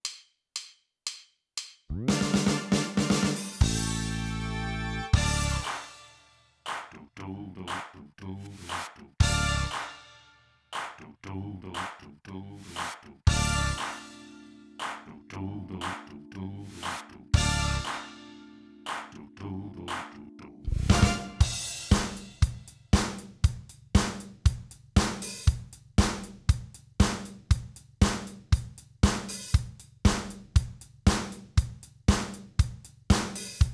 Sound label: clarinet and sax backing